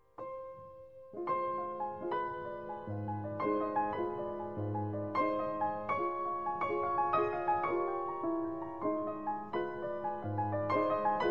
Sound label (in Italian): ultimi pezzi per pianoforte elegie